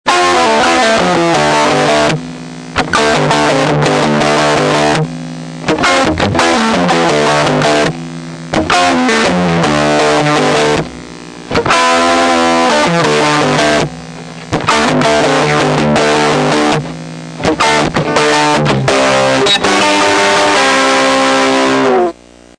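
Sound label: Univox Squarewave, Wave: max, guitar; Recorded by Gary Burchett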